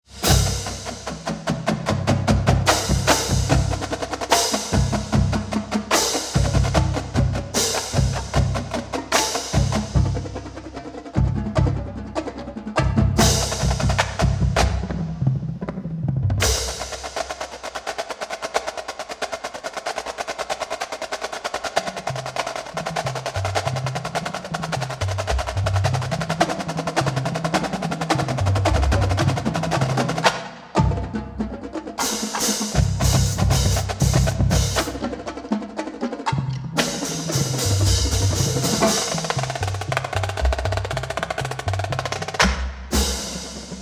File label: Drum Feature